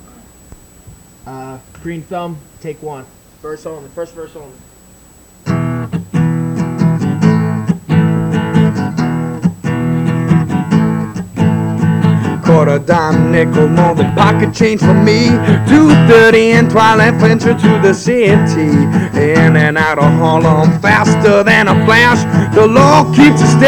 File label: Solo Acoustic; Rutherford